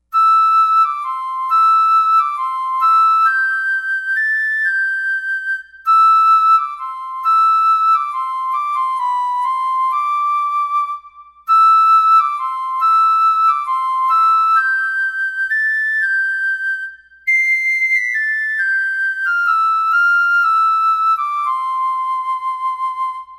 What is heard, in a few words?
piper